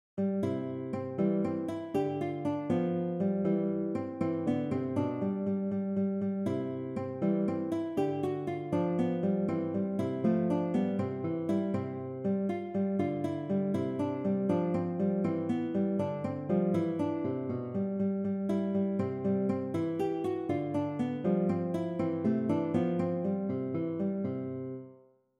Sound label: Walzer, konzertgitarre